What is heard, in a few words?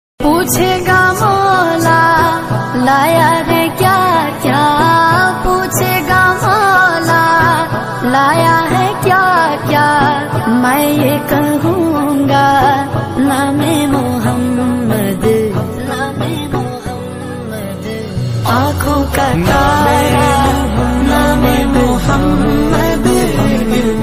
islamic naat ringtones; name Muhammad